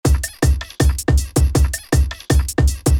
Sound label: Jersey Club Bed Squeak Pattern